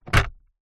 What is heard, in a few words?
vehicles car glove box close toyota rav4